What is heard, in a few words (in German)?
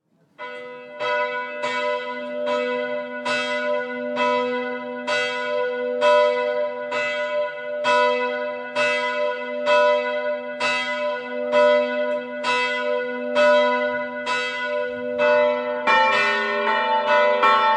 GLOCKEN Wallfahrtskirche; 14 GLOCKEN Wallfahrtskirche Poellauberg